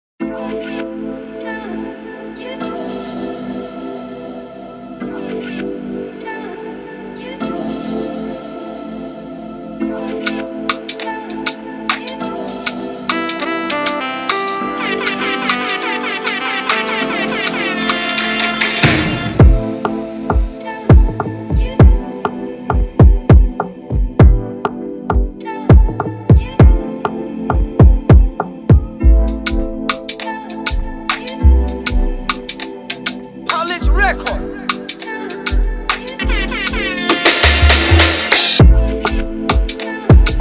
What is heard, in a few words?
ambient afrobeat intro; Instrumental